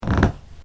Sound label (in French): Crac court